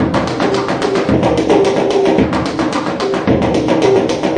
japanese syncopathic, 110 bpm, drum loop; 101 to 110 bpm

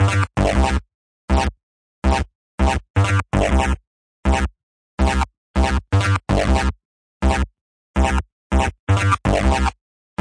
162 burning sparse bassline, BASS LOOPS

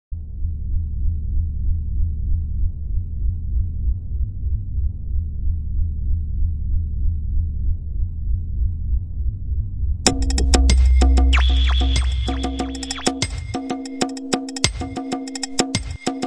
190 ethno d n b clanger

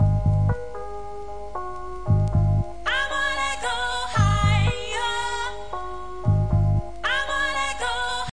115 wanna go higher, sampled music loops

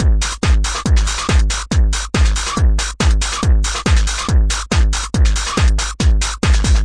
140 raw meat techno, drum loops

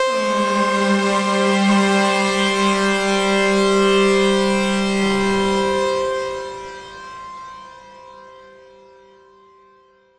one epic sawtooth blast, Synth

